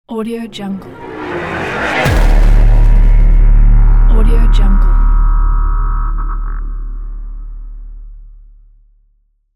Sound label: hitech logo; paul-woln